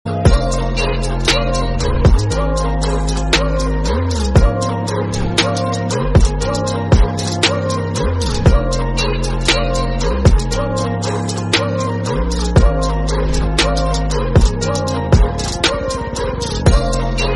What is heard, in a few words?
freestyle trap beat